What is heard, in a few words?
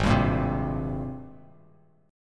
G sharp Major 9th G sharp, Bass Standard; G#M9 strummed; JGuitar